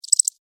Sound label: Cricket Chirp, Cricket, Chirp, Single, Insect, Bug; Sound Effects:Animals:Insects; Crickets; Blastwave